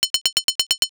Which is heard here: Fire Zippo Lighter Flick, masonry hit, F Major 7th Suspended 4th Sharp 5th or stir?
stir